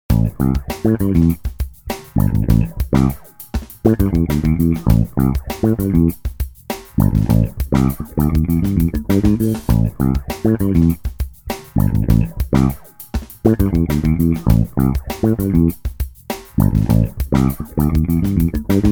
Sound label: filter twin